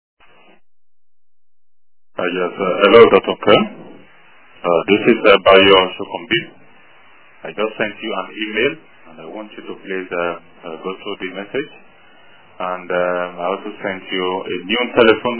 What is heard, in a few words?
Yawn